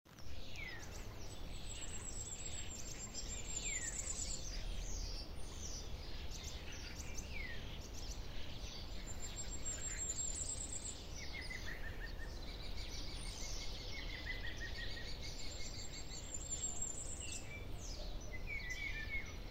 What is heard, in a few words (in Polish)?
zniczek, ptaki